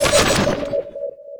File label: Pickup Scifi; SFX Kit